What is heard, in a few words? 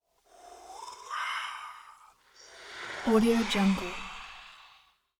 choral wind exhale breath eerie; prosoundfx